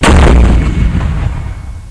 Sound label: tank mortar sound